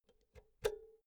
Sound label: power cord pull out soft; objekte; elektronik